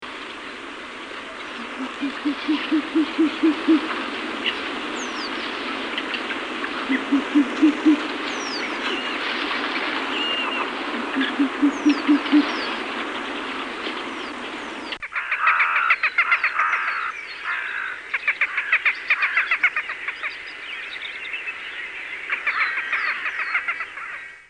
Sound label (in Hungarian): Rйti fьlesbagoly; Madarak